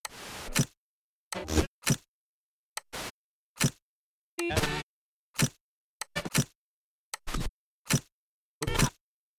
Channel Change Rotar

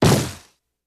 Camera Flash Pot; Camera flash, flash pot, sharper; cameras

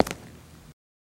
FS Male On Hardwood, singles wood

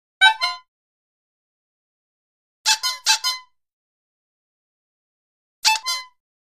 Horn 2 Toy Horns Honks

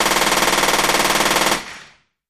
Machine Gun Sngl Rap, single burst

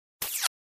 Flash Gear HF Elect La, flash gear

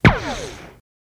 Machine Gun Silenced, silenced bursts

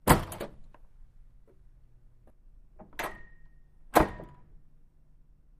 Door Push Rail Wood

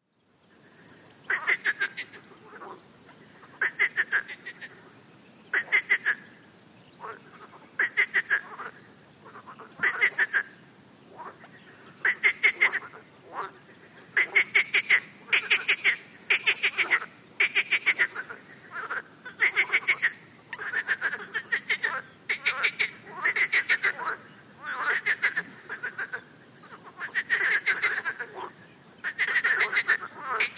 Frogs Ambience

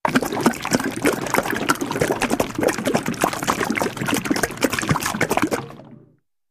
Fast Liquid Pour Spl, water

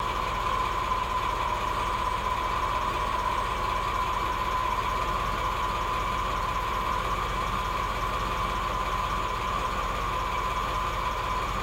Cadillac 1990 Idle Loop

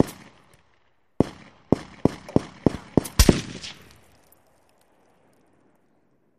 Artillery War Seque

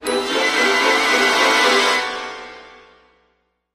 Music Trans Horror O

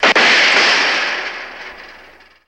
Metal Fence Crash; fences

